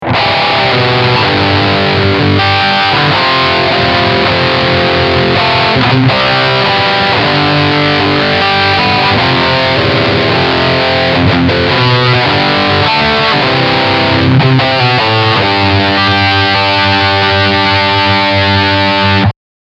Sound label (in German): Riff Steg Humbucker